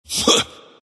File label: grunt; dota; ember spirit